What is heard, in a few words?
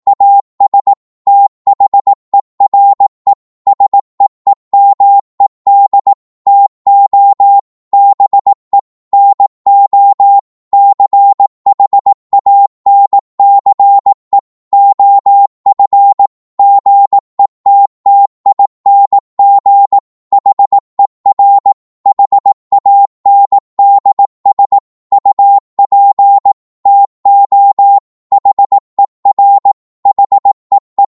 18wpm, Morse